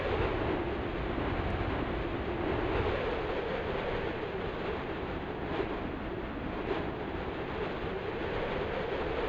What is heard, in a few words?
Cockpit Wind Rush